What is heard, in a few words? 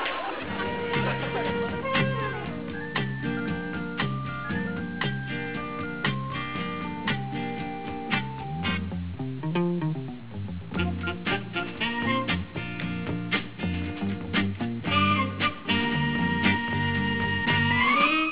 funkadelic; bradybunch